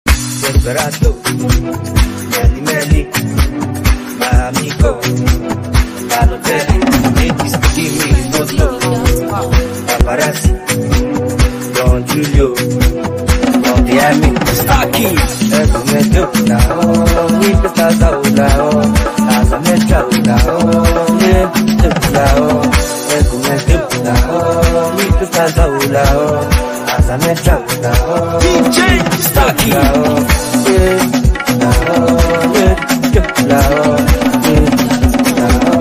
Laho Dance Drum

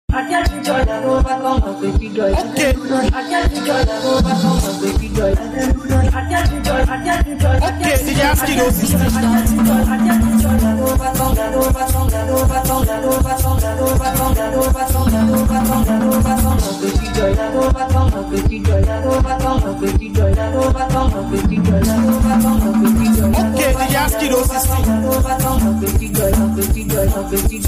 Beat

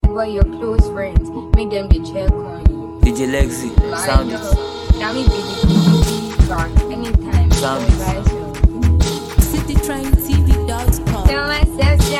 Mara Beat

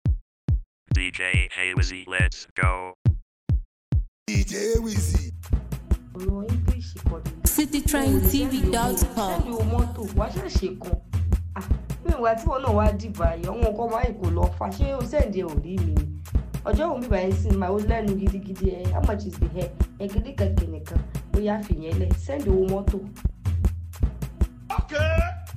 Cruise Beat

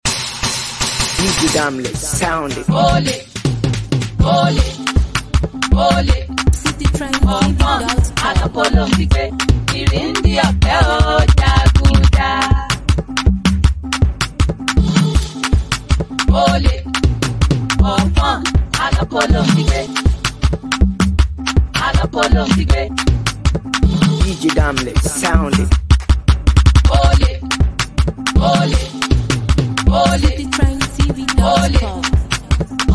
Ole Dance Beat

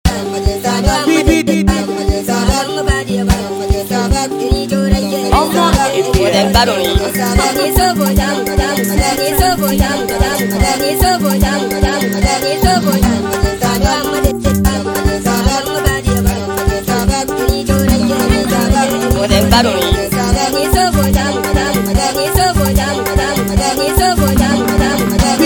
Agbako Beat

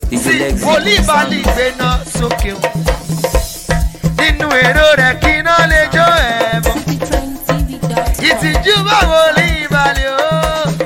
Hip Hop Praise

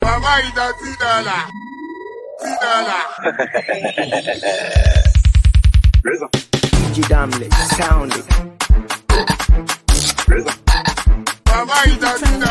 Dance Beat

